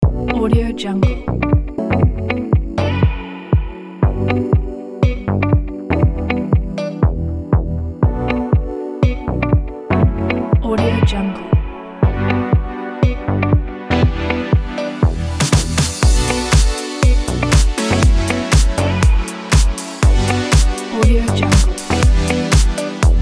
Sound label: upbeat fashion luxury